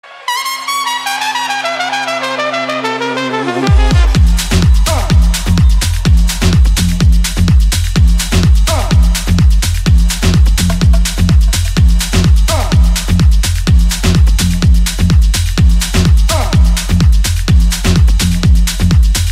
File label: La Trumpet